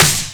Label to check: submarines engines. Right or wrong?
Wrong